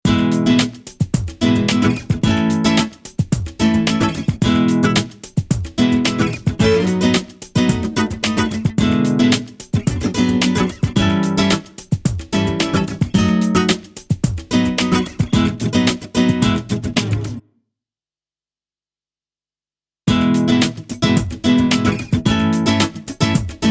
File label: Funky 110bpm Drums